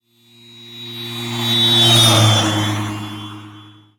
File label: car horn; Blind Drive